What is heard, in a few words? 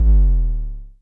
EBASS, Dual Percussion Synthesiser; Klone